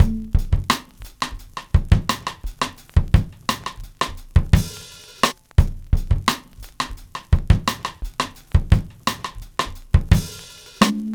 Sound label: 20 MEAN GREEN 86 bpm, Loops Breaks; POTBreaks